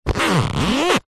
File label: plastic rub squeak with hand; foley